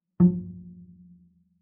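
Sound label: Bass pizzicato fortissimo sul A F2, Double Bass; MIS Pitches - 2014; Strings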